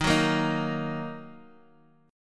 D sharp Minor, Guitar, DADGAD; x,6,4,3,6,x; chordshape

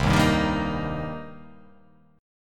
C Minor 7th C down

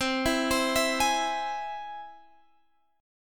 C Augmented, guitar chord

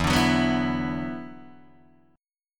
E 7th Suspended 2nd, down, Guitar, Open D

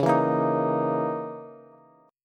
Db Minor 6th Add 9th Db down Bass; Dbm6add9 strummed; JGuitar

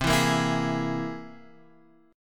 C 7th Flat 13th C, chord

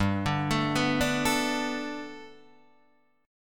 G Major Add 9th Guitar Open D, chord

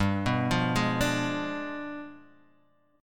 G 7th Flat 9th, Guitar, Open D

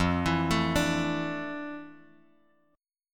F Major 13th Bass Standard; arpeggiated; JGuitar

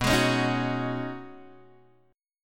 B 6th Add 9th B down Guitar Open G

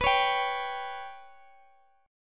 B Suspended 2nd Sharp 5th

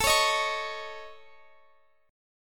A Major Flat 5th, Guitar, Open F; x,x,21,20,18,20; AMb5 strummed

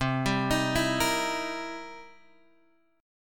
C 7th Sharp 9th C Guitar Open G

